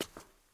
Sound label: footstep stone; Ben 10 - Omnicode